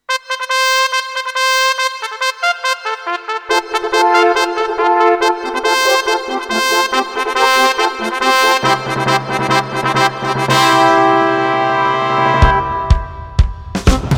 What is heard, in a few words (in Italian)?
Tanti auguri Melodia